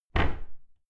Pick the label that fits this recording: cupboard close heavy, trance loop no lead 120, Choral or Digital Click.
cupboard close heavy